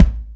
KICK, Percussion; InVision Interactive; GRUNT

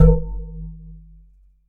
JUG HIT

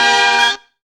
ROOTS HIT; Zero-G; Funky Elements

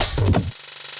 LOOP SD, Drum & Bass, 180 BPM; Ueberschall; Drum & Bass Resonance

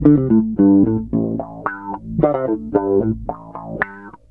SWEEP 111 C, Bass Grooves; USB Soundscan vol.30; AKAI

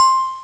Glockenspiel, Amiga Soundtracker; ST-XX Amiga Soundtracker Sample Packs

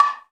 Bongolo, Latin Percussion; Sonic Foundry (Sony Creative Software); Joe Vitale Latin Percussion

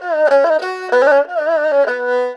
ERHU LICKS

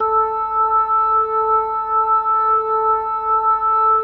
A4, B3

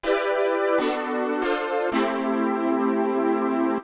Synth Loop (125BPM), retro house

